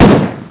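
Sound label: pistol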